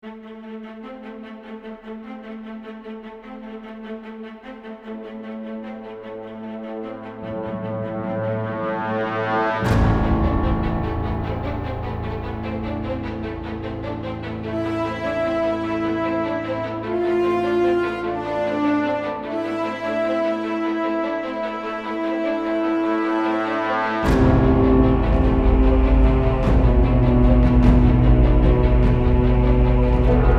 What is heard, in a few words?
ambiance gravitational collapse